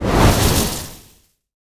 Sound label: dark fire; smite; hel